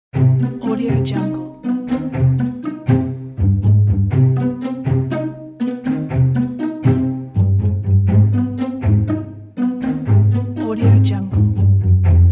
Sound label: quirky